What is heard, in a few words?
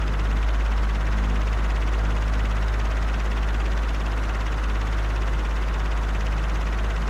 electric fan middle